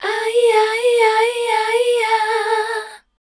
MELODIC A, Voice; Best Service; Voice Spectral II

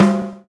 TOM TOM; E-Lab; X Static Goldmine 2; akai

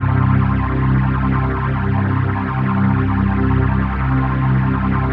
PHASE PAD, ATMO PADS; Best Service; XX Large

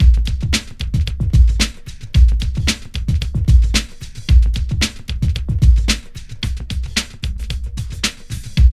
PHAT LOOP; E-Lab; X Static Goldmine 2; akai

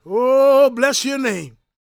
GOSP MALE, Vocal Planet Gospel

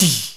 SNR

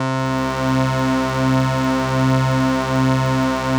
Atmo Pads; Best Service; XX Large

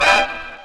HORNHIT, HORN STAB

